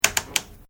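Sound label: Switch Oscilloscope Turn Triple Click, Mechanical switches; Electrical Button Sound Effects; Electrical Buttons (Sound Effects:Multimedia)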